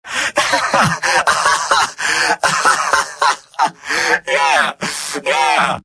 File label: scout laugh long; tf; mvm